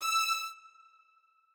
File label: strings